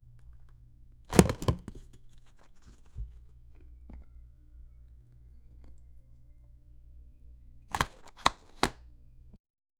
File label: put down carton of milk, squishy